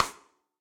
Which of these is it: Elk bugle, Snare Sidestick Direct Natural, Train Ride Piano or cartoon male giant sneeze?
Snare Sidestick Direct Natural